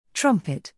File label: trumpet